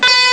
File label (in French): guitare; ST-XX Amiga Soundtracker Sample Packs